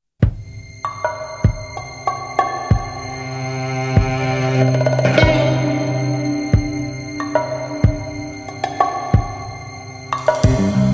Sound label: SUSPENSE